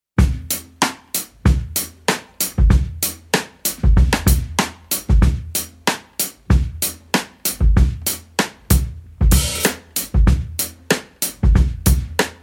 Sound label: 95 BPM Bump Break